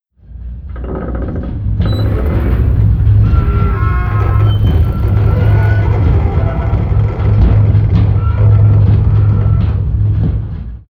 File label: Wind Mill Start; moul